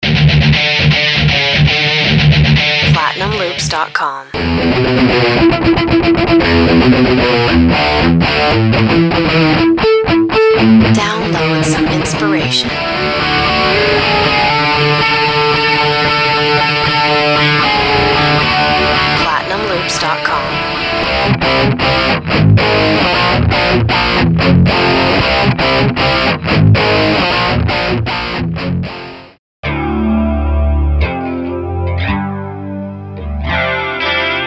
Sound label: nu metal guitars voice duck